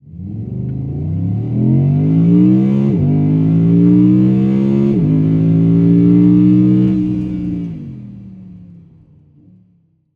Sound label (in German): Audi R8 Spyder Beschleunigungsfahrt Innen ohne LC mit SS; r8 v10 quattro; audi sport